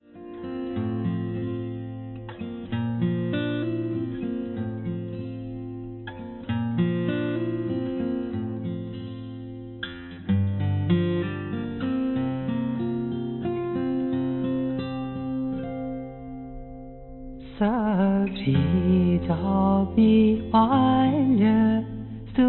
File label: lofi